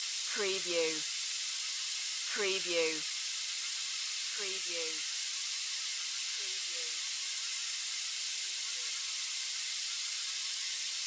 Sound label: Ambience Jungle Day Loop; Nature Sounds / Ambiences